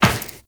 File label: Run Metal Perforated, Footsteps; Warframe; Tenno